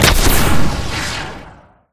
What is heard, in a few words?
Missile Fire